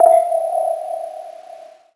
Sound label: ping